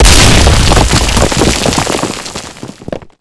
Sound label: collapsing burst; gulag; Call of Duty Modern Warfare